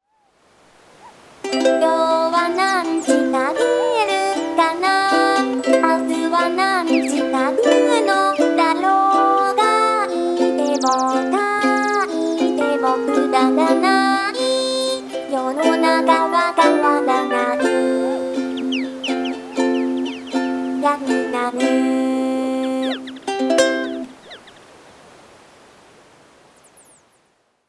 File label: world and i uke